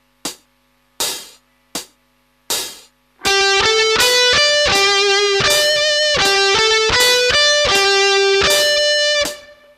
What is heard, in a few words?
vib